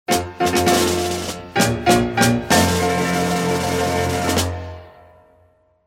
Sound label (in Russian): zvuki pobedy